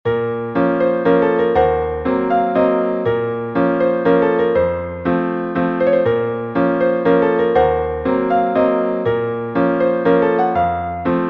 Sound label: waltz b flat minor